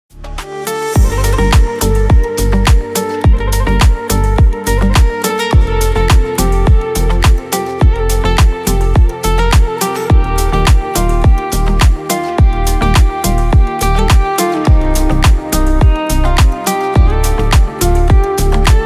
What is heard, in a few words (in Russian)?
zvuki vostoka na zvonok